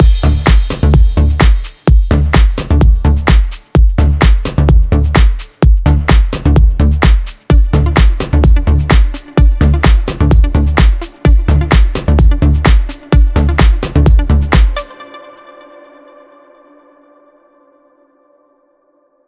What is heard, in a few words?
128BPM Mix; Vengeance Samples; Entourage Kit